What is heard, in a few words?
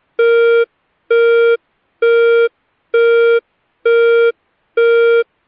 Beep Slow Intermittent Horn 470Hz